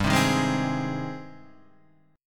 G sharp Major G, guitar chord; strummed; JGuitar

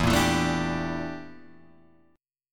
G Minor 6th Add 9th E

